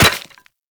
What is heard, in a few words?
Run Bone, Footsteps; Warframe; Operator